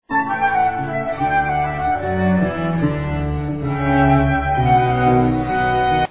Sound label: flute